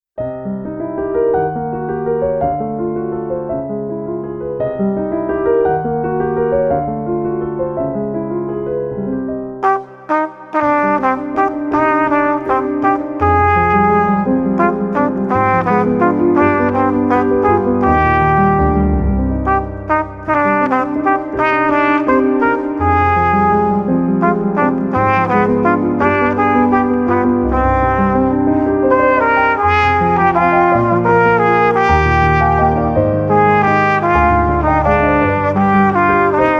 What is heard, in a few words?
Waltz